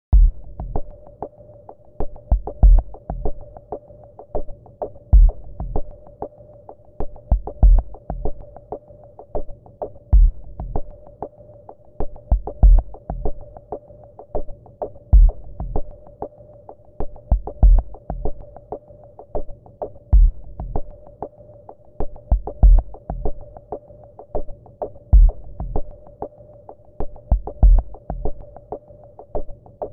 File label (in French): etranges circonstances drums